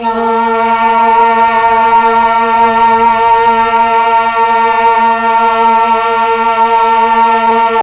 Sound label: MELLOTRON vox; Big Fish Audio - First Call Vintage Keyboards